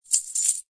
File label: drop exp; croods; battlespecial